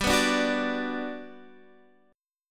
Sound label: G Major 7th, G, down, Guitar, Standard; GM7 strummed; capo=2